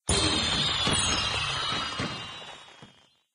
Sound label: win; bouncy golf